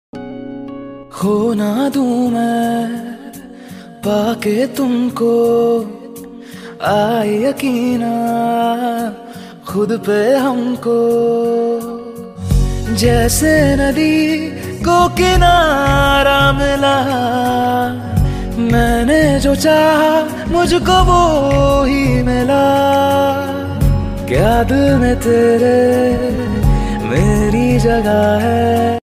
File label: Love Hindi Song Ringtone